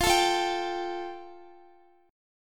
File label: F Major Add 9th, Guitar; strummed